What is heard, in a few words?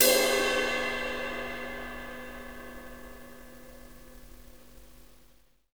CYM BRUSH, Brushes; Roland - Rhythm Section